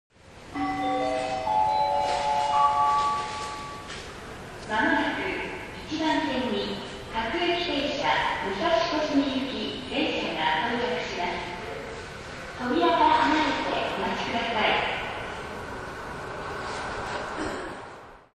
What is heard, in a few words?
arrive, rail sounds; Toei; Mita